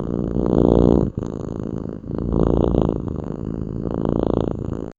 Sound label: purring of the cat